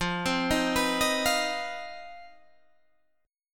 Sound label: C Minor Major 7th Double Flat 5th F, chord